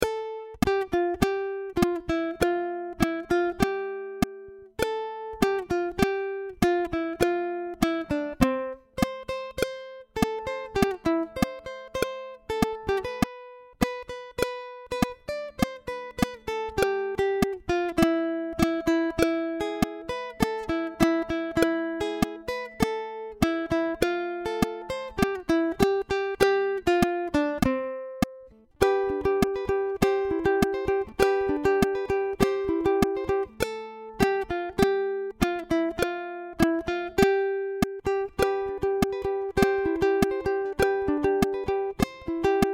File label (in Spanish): A la Una Solo Click